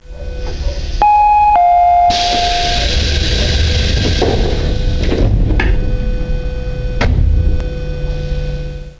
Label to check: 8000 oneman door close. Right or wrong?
Right